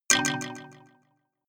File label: notification kalimba delay minor low